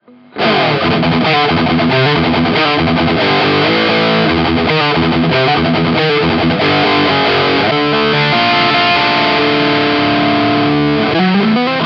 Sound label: Kammer Tiny K test high gain boost